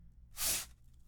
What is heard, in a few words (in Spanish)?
Deslizar